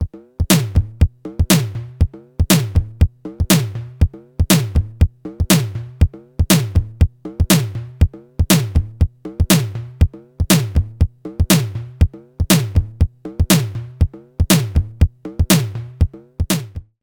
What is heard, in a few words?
Simmons SDS 1000 Lo Trigger Sens